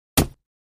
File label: Axe Paneling 2 Side Du, axe hits